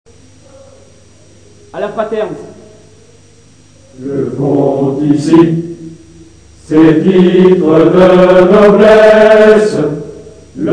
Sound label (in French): Hymne; fraterns